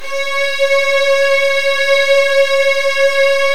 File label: C#2, Virtuoso Strings